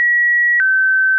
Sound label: pipo sound